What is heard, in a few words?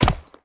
pet footstep; smite; lancelot